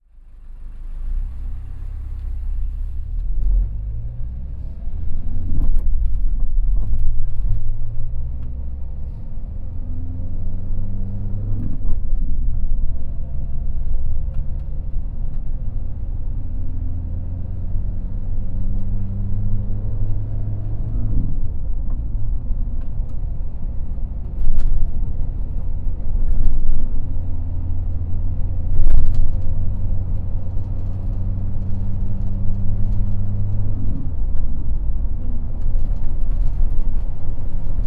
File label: Acceleration